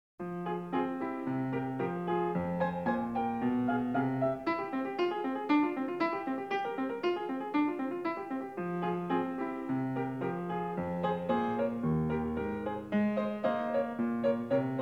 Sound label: Prelude No. 12 in F minor, Bach, Well-Tempered Clavier; BWV881